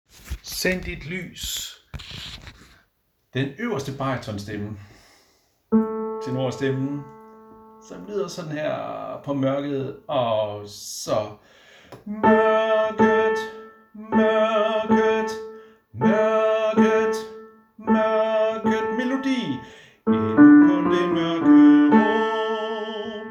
bariton; harlev koret

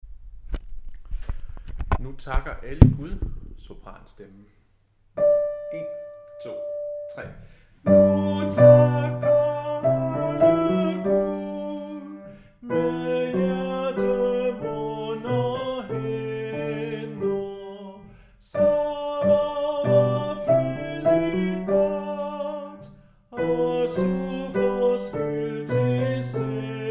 sopran; Nu takker alle Gud; harlev koret

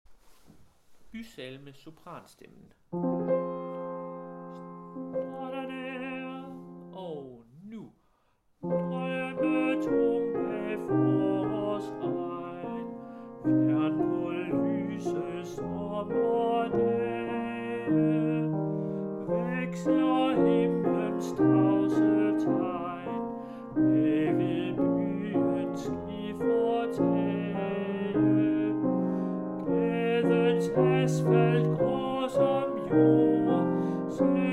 sopran; bysalme

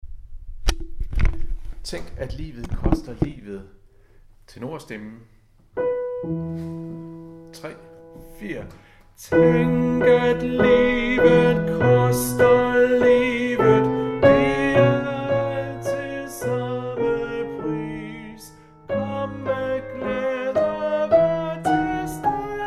tenor, harlev koret

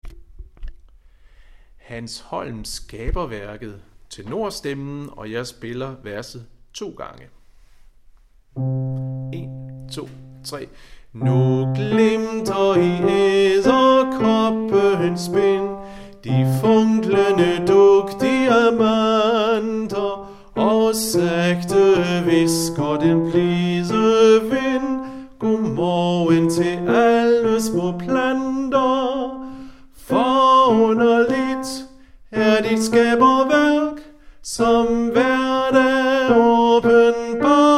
tenor; Skaberværket; harlev koret

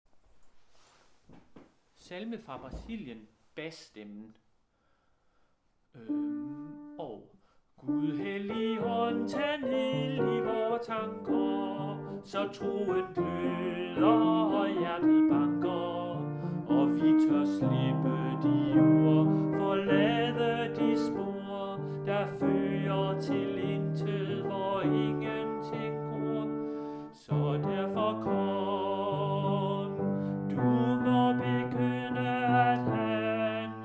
bas; Salme fra Brasilien; harlev koret

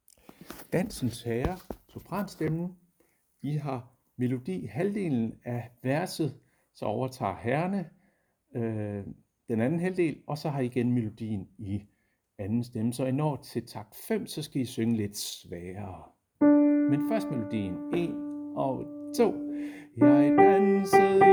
sopran; harlev koret

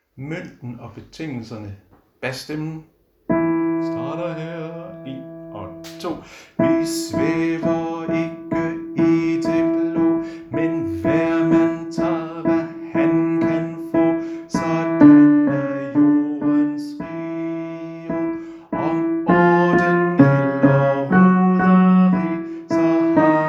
bas, koret

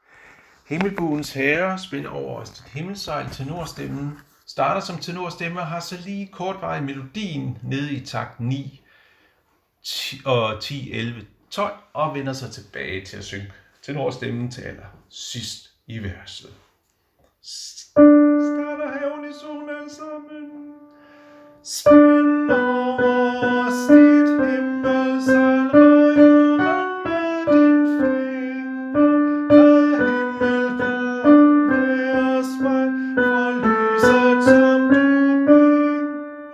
tenor; Himmelbuens herre

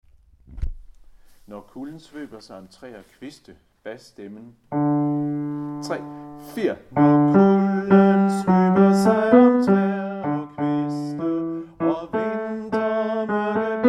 bas

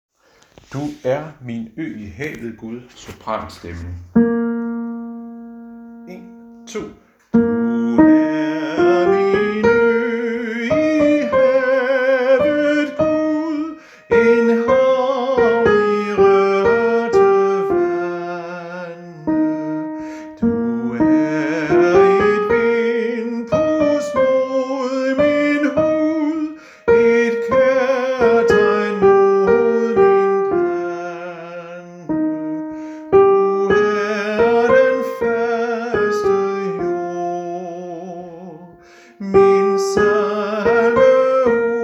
sopran; harlev koret

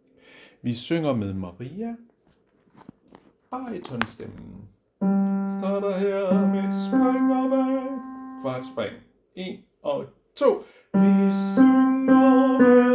baryton; harlev koret

